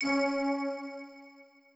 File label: crystal; Android